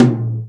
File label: tom, Real Drum Kit, jungle kit